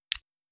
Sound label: taser x26 click; freeman